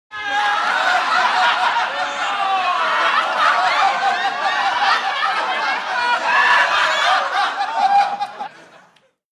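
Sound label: Crowd Bursts Laughter, medium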